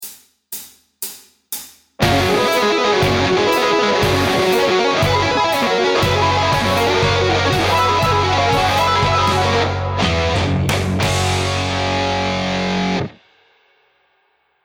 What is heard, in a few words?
Mixolydian Massacre